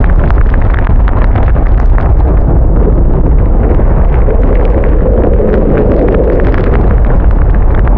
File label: bacteria, ambience